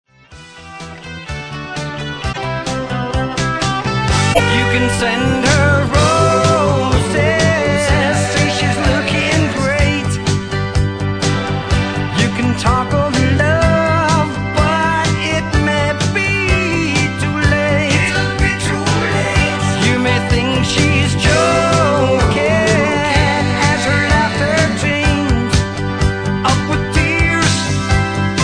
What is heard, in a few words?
laughter